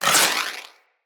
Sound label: Wall Hand Slide; Tenno; Footsteps; Wall Run